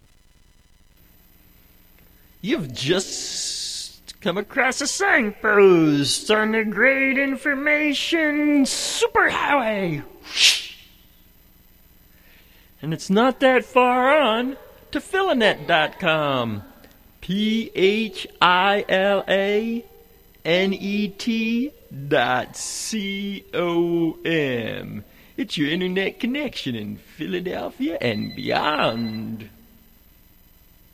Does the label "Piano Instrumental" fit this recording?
Yes